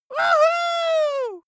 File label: dwarf cheer "wuhu"